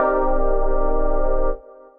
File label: Sale Key Pad; Ableton; Factory Packs; Punch and Tilt